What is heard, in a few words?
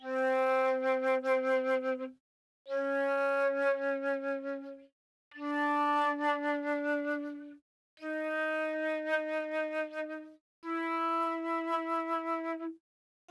flute vib; uiowa